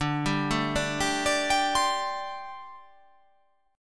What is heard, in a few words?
D 7th Suspended 4th, down